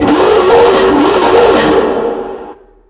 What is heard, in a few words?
see enemy, combine gunship; hl2